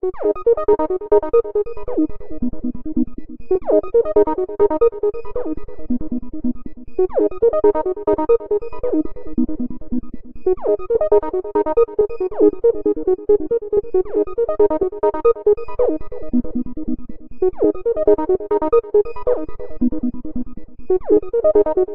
menu; blitzmax; digesteroids

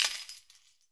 Money; MonoGame; RolePlayingGame

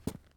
footstep; simondev.Quick FPS1

